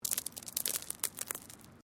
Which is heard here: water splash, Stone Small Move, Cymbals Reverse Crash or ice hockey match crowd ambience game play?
Stone Small Move